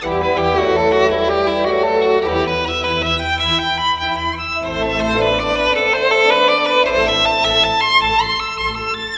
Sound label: strings despair, Sad Loops